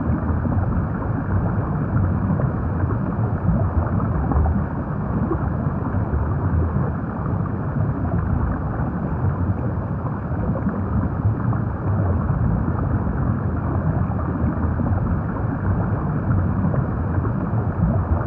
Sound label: ambience underwater